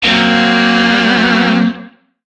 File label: Shawzin Blues Chord; Warframe; Lizzie Shawzin